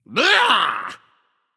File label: ugh; smite; xingtian